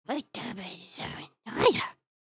indignant, emotes

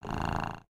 Kitty Cat Purring; creatures organic; aronwk collection; ndaudio

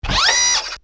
BroomBot servo; creatures mechanical

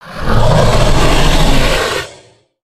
Dragon Roar; creatures organic

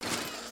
Fidget Arm; Overbuild; emotes

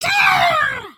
Sweep; creatures organic

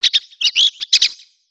3D Birds Swallow; LU; ninjago; ambience ninjago

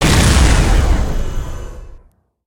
Spider Boss Fire Rain Impact; creatures mechanical

